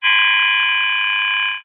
science fiction radio receiver transmission interference burst feedback glitch; zapsplat